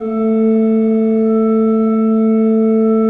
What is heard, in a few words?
A2, Cathedral Organ; ILIO; Propeller Islands Cathedral Organ